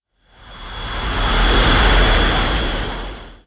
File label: harrier